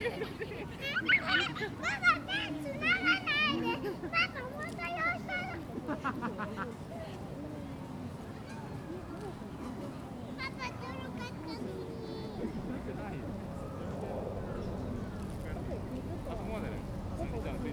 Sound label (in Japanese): 新宿御苑